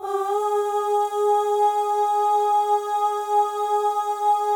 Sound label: fem ohs; Voices; FEM.1 OHS